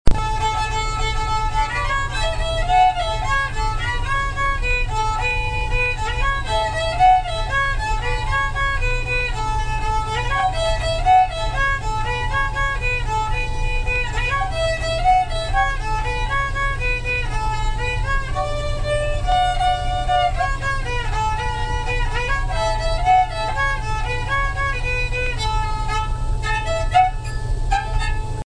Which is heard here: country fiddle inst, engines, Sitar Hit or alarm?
country fiddle inst